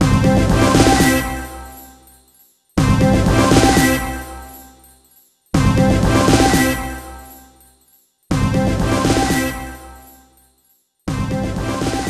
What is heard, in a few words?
Jingle ~ Game Over; Adventures of Lomax